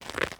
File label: Ice Footstep; UltimateFishing